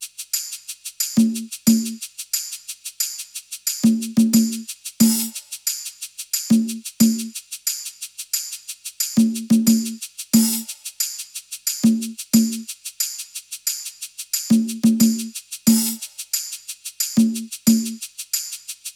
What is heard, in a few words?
perc 90, Drum Loops; Top Loops; SD MIDNIGHT Sample Pack; Slate